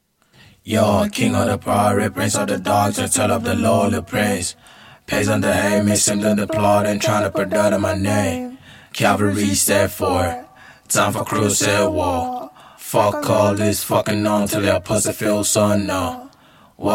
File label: hardstyle, 122, Abm, acapella